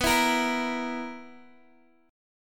B Major 7th Suspended 4th, Guitar DADGAD chord

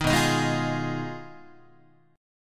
B 6th Flat 5th, down, Guitar; strummed

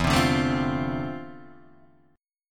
D-Minor 7th, E; Dm7/E strummed

